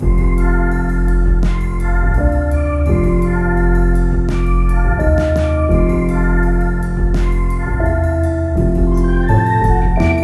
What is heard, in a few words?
Modern, 84bpm; Ultimate Everything Music Bundle; 12 Loops for All Occasions; Hopeful Action 4